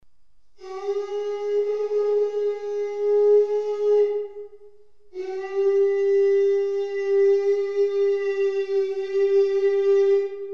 small horn, Blowing Horns

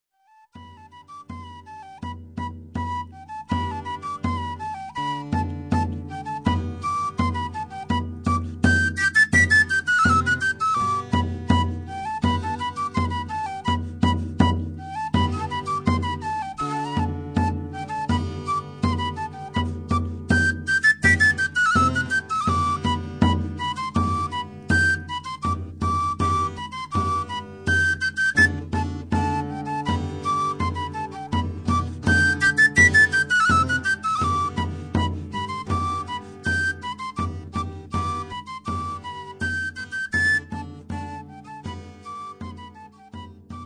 White Cockade Pipe and Tabor Guitar

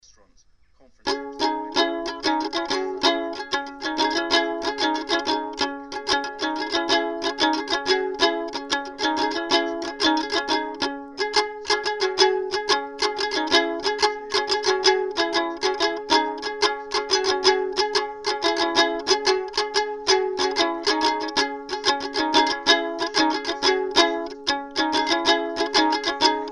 Gusli, kantele; Gdansk

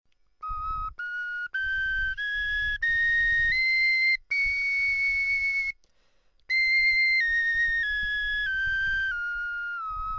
bone flute; stock bone flutes

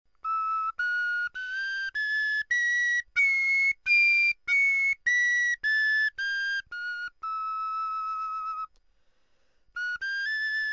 bone flute; stock bone flutes

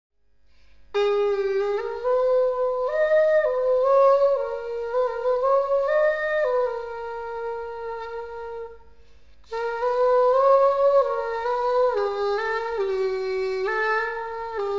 large bukkehorn, Blowing Horns

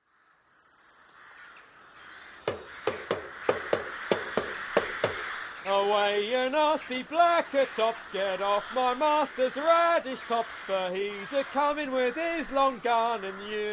birdscaring songs

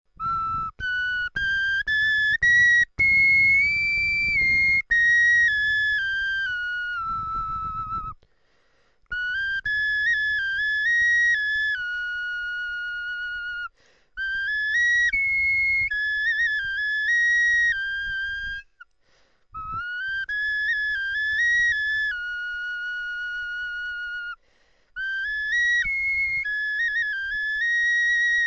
bone flute; stock bone flutes

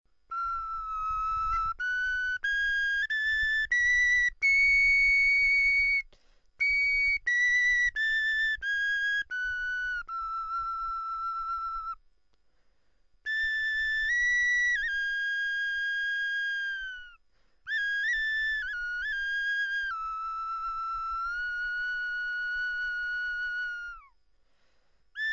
bone flute; stock bone flutes

